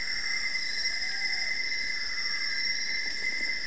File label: parrot exit